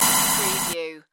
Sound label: digital data glitch